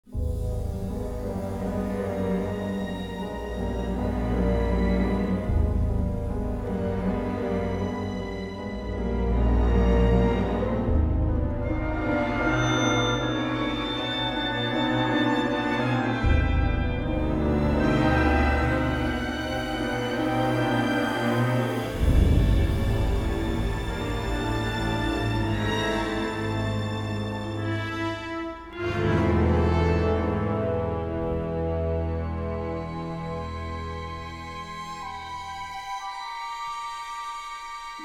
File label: Loading Loop